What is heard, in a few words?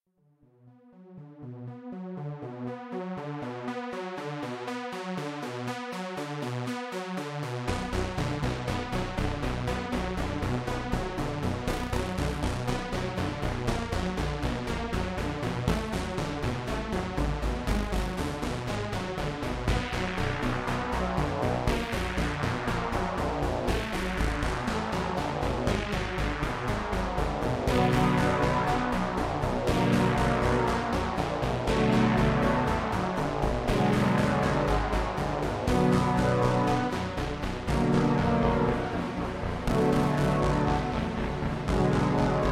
menu, music; Mindustry